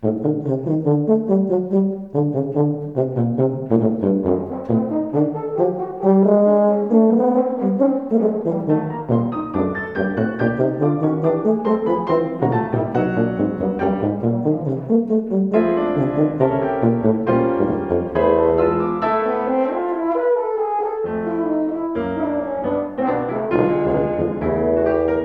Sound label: Suite no. 1 for Horn, Tuba, and Piano Alla Caccia; V. Alla Caccia; Alec Wilder; umass recitals